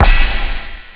kick + hh; jazz jackrabbit 2; M8

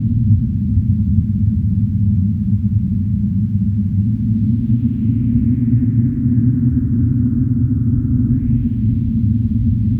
ambience

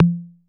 808 CONGA; Music Store Sound Service; Einstein

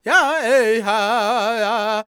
G# MIN, World Voices, LAKOTA; Spectrasonics Vocal Planet CD5

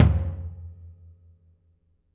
R B Floor Tom B Close; Toms; Sonic Foundry (Sony Creative Software) - Drums from the Big Room The Mixes; R&B One Shots

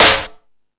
Discoball, drum machine

